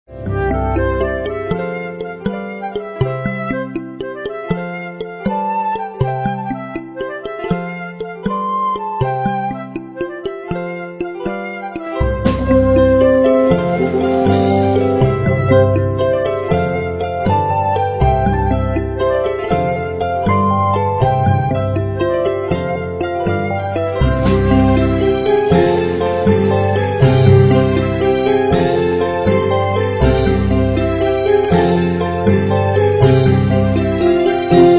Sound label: Infantil, instrumental